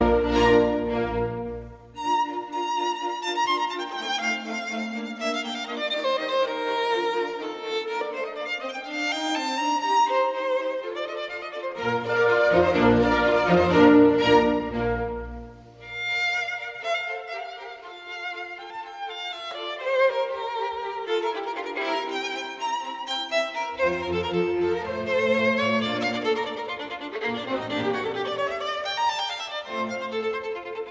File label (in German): violinkonzert nr 1 b dur kv 207 allegro moderato